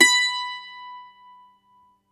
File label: MANDOLIN, Plucked Strings